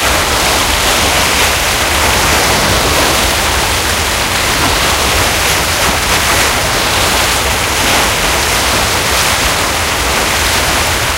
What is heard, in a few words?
tire water int, tanks; War Thunder